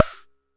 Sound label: Jomox MBrane, drum machines; Jomox MBrane 11